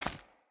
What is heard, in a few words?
Run Stone, Footsteps; Characters; Mother